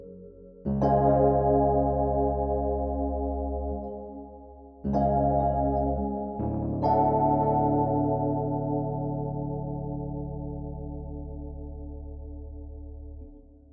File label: Tine MK l MK ll, Slow-Fox; ChordVintage; Pianoteq